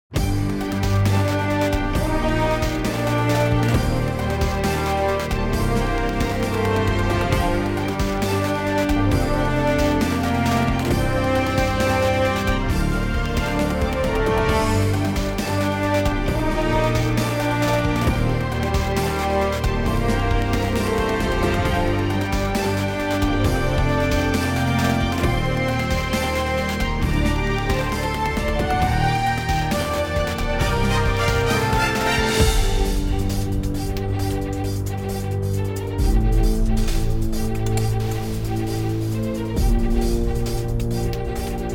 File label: main menu music; override mech city brawl ps4 switch windows xbox one gamerip 2018